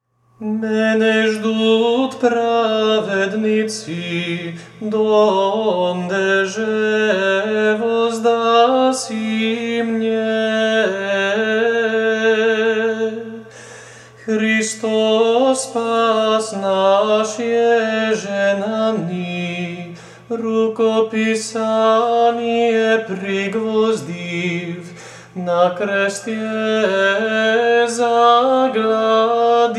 Stihir drugi glas, Serbian chant

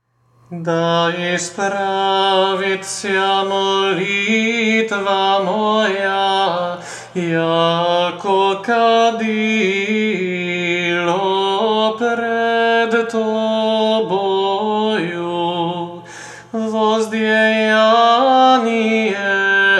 Da Ispravitsja sedmi glas, Serbian chant

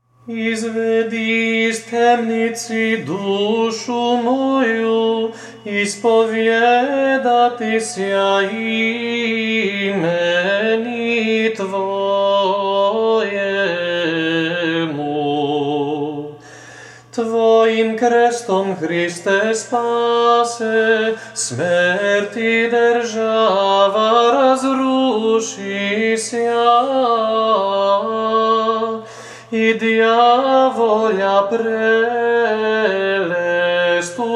Stihir treci glas, Serbian chant

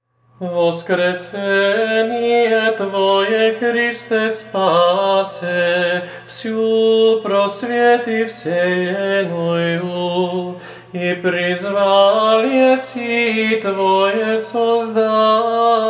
Apostika drugi glas, Serbian chant

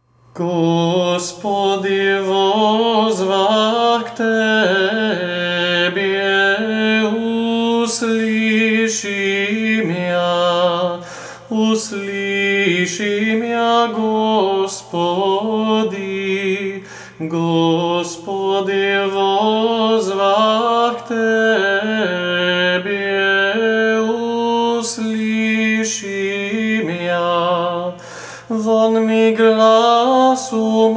Gospodi Vozvah sedmi glas, Serbian chant

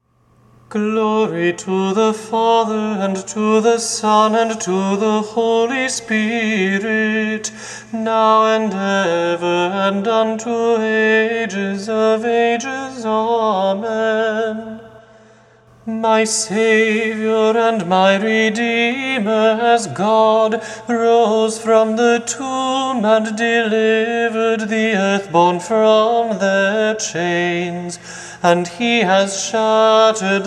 Res kont tone 4, Serbian chant

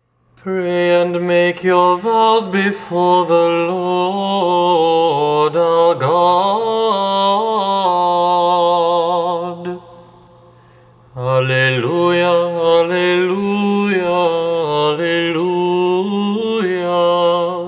alleluia tone 8, Serbian chant; Resurrection Prokeimenon & Alleluia - Tone 8

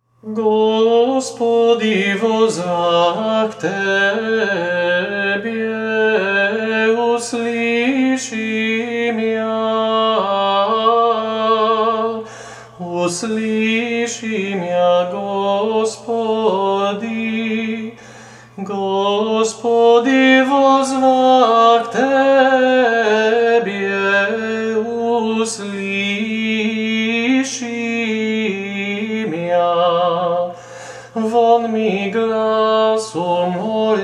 Gospodi Vozvah drugi glas, Serbian chant

